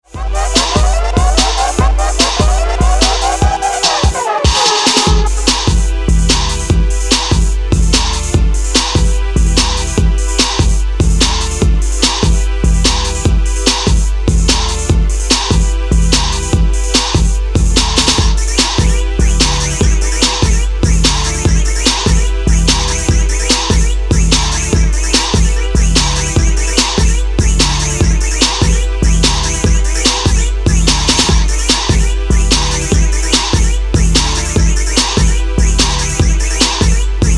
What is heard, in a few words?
Bass